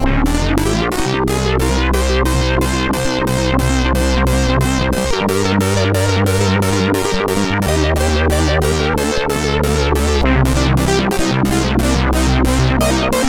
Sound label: sid CSG8580R5